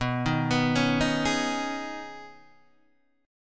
B 6th Add 9th B Guitar DADGAD, chord

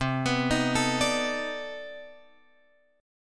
C Minor Major 13th C; {8 X 9 8 10 10} arpeggiated

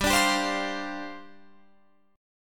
G 7th Suspended 4th G down Guitar Open F

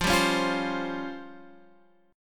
C sharp Major 7th Flat 5th, chord; C#M7b5/F; strummed; JGuitar

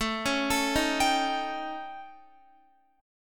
A 7th Flat 5th Guitar; A7b5; arpeggiated

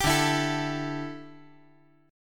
D Suspended 2nd Suspended 4th D down

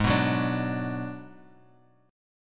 Ab Minor 7th Flat 5th, Bass Standard; strummed; JGuitar

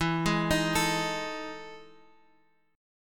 E Major 11th down Guitar Half Step Down; EM11

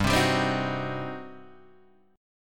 G Major 7th Suspended 4th Sharp 5th, G; GM7sus4#5 Chord {3 3 5 5 4 2} strummed

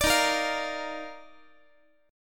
D sharp Major 7th Suspended 2nd, Guitar; strummed; JGuitar

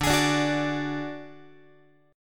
D Major Flat 5th D Guitar, chord; strummed; JGuitar

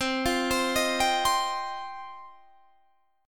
F 7th Suspended 2nd, chord

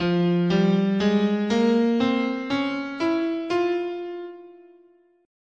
F Harmonic Minor, scale

